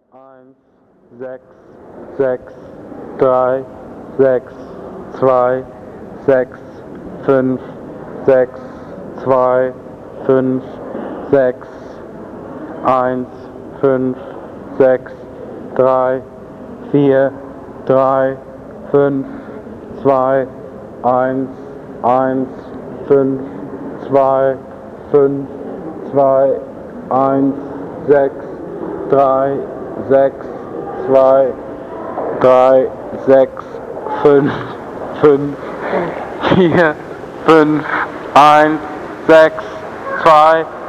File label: shrine dictorphone mexico, street recording